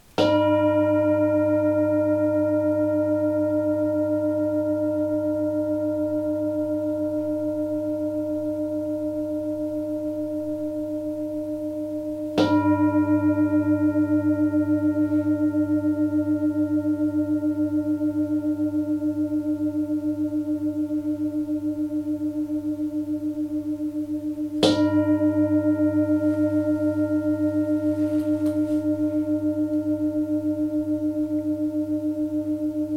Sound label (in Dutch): Klankschaal